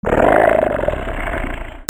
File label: ANIMALS